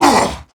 Pain, Male

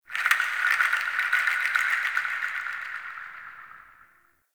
Wood Chimes; Warframe; Nokko Colony; Ambience

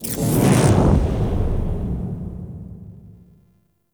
Landscape Transition; Warframe; Duviri; Dioramas

